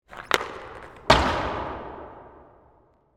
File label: Hockey Puck, Shot; Sports; Into, Boards; BlastWave